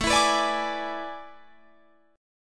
A Major 7th Suspended 2nd Suspended 4th, Guitar, Drop D